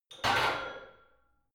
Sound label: barbell heavy plates replace rack reverby hard; sport freizeit; fitnessstudio